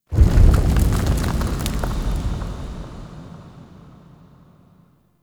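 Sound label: Distant Explosion Debris; Warframe; Ambience; Nokko Colony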